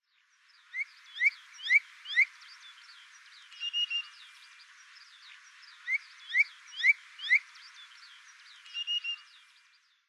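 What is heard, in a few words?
Crested Myna; himeji; koe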